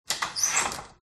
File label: open store; fox audio